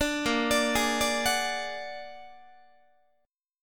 Bb Augmented 7th Bb down Guitar 4A,4E,5A,5D,5G sharp,6C sharp; Bb+7; arpeggiated; JGuitar